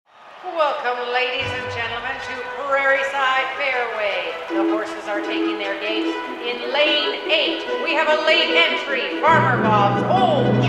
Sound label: Horse Race vocal